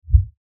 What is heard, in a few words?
LFE Hit, Lfe Hit Low Bass Impact; Blastwave; Sound Effects:Sound Design